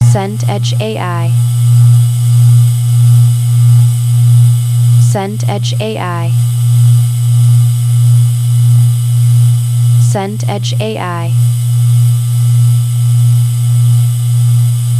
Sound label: room tone garage